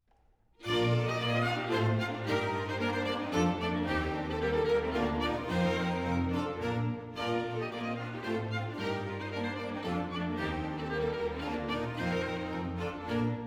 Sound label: hornpipe